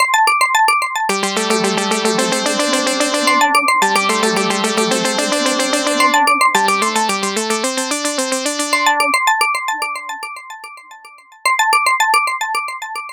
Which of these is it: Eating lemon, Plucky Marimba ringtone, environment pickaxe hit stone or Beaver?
Plucky Marimba ringtone